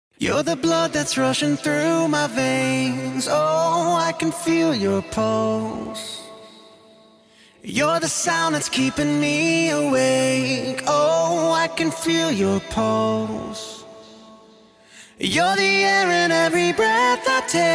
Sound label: Studio Acapella